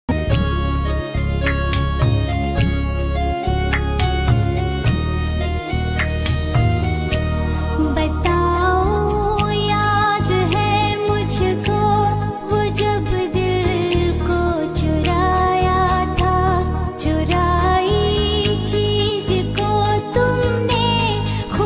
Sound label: Female Version Sad Song Ringtone